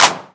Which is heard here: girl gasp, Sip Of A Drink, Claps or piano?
Claps